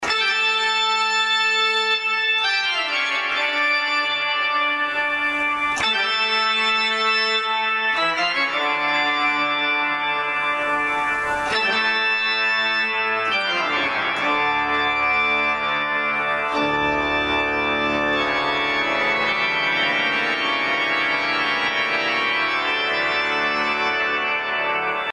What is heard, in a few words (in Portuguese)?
musica orgao